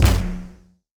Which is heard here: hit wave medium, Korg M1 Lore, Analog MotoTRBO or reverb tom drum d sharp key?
hit wave medium